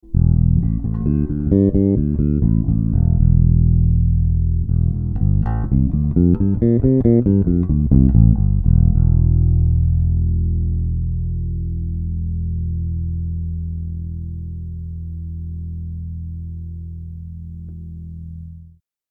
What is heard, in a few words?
shield Bass; BELDEN 8412P